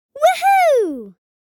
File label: Woo hoo cheering boy child kid